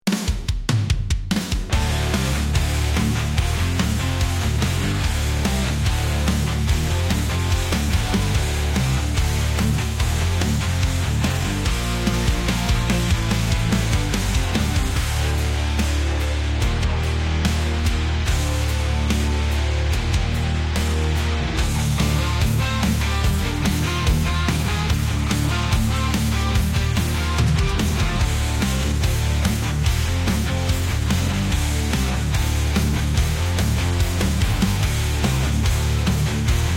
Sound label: rust and ruin, agressivnaya